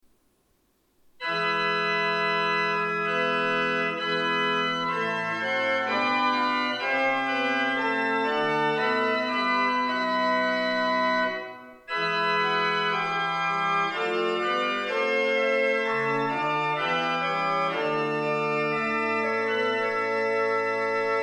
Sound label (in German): orgel